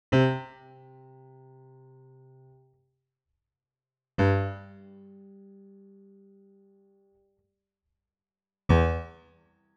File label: resonances, piano